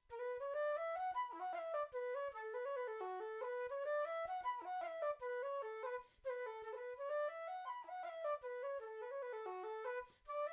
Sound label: Prelude Polka; Mc Dermott's